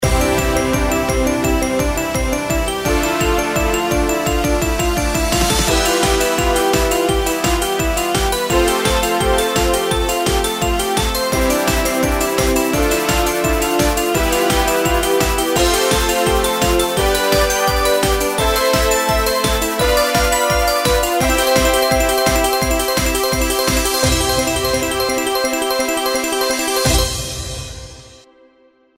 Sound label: mega win; Golden Shanghai